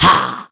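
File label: mob boss attack; Monster; Bossmob; 몽면마불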